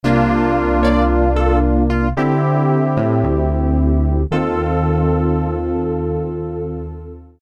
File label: PolyPulse